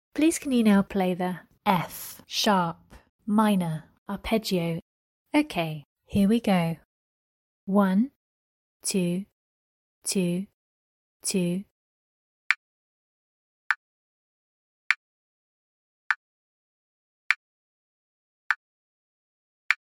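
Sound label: F sharp minor arpeggio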